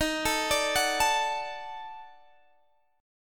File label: Eb Minor Major 7th Flat 5th, Guitar DADGAD